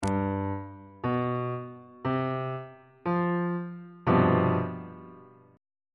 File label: c major 7th suspended 4th 2nd inversion